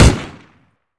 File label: mac10, weapons; Counter Strike Model Pack; CS:S